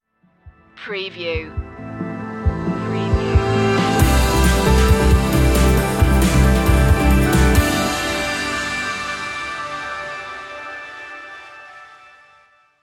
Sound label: free stinger